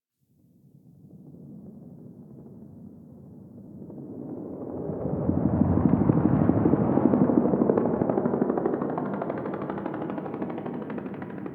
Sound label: Distant Ambient Helicopter; Warframe; Ambience; Nineteen Ninety Nine